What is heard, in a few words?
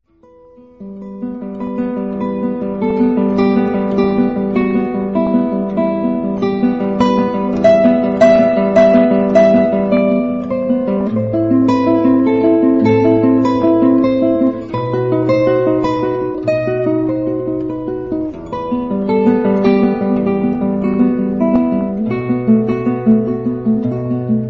romantic guitar